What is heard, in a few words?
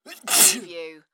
Sneeze Male; sound effects bible